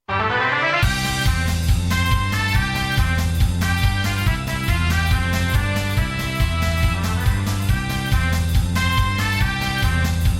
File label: ringtone